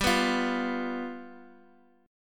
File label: G 7th G down Guitar Drop D